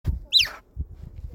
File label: Cheetah Chirp